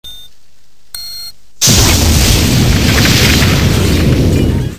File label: bomb